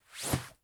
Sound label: Rustling Down Jacket